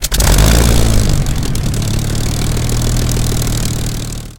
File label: engine start; War Thunder